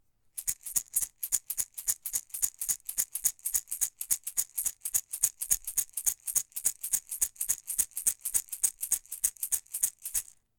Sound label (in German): minishaker mittel